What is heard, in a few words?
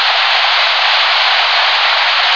Water Sound